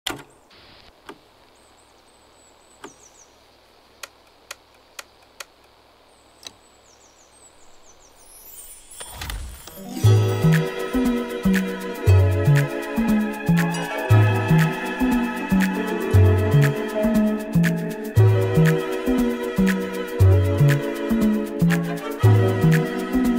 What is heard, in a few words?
Jazz, Afro & Latin House